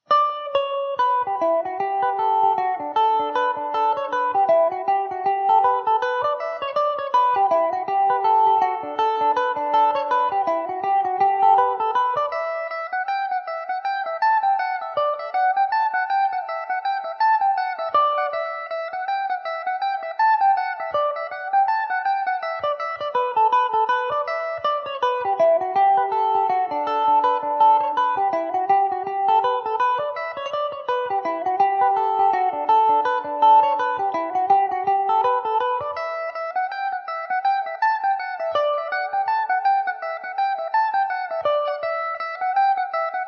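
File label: E Dorian, Irish mandolin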